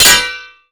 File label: block